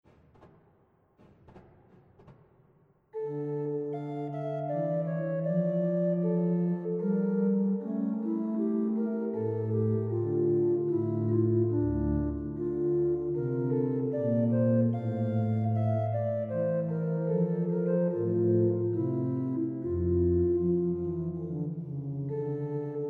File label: Menuet, Organteq Beautiful Flute 8; Anon